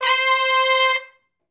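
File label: Hi Medium Short Note (C) unison, Horncraft for R&B Sections; Crimson Blue and Fabulous Horncraft 4 RnB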